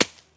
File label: ball hit bush; golf; ProStroke Golf World Tour 2007